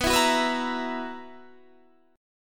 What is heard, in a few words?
B Major 7th Suspended 4th B down Guitar Standard; BM7sus4; strummed